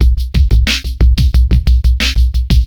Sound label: System Funk 90bpm; FL Studio